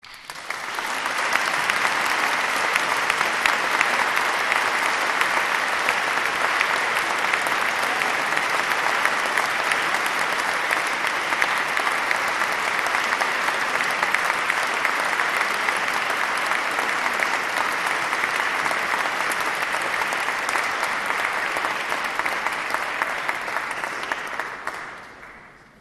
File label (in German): Applaus